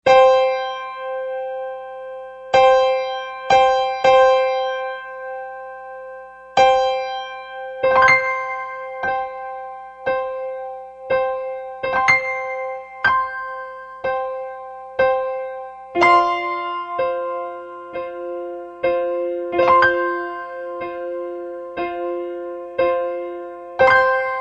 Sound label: Open 5ths